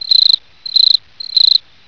night cricket

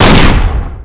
type68 shot

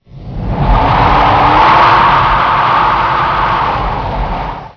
wind gust

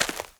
Player Footstep Dirt

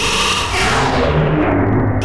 portal loop

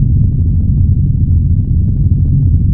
canyon

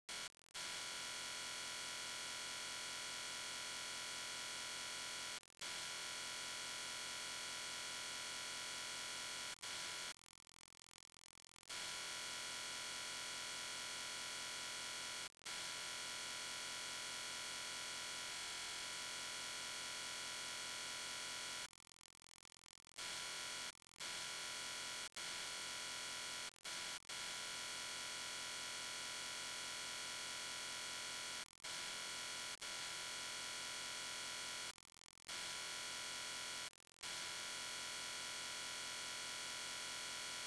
bootleg tape

